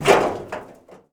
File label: IMPACT Metal Sheet Subtle; mono; IMPACTS; Universal Sound FX